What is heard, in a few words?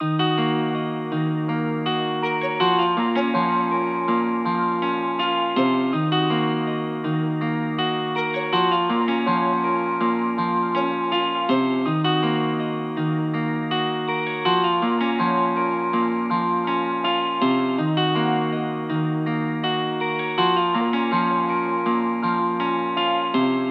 Various Melody Loop 71 BPM D# Min; Cymatics; Oracle Sample Pack